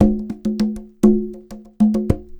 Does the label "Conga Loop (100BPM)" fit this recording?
Yes